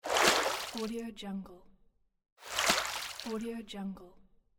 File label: water splash; پاشش آب; game sounds